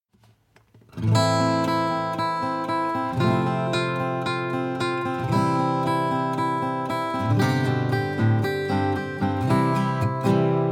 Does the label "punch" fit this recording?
No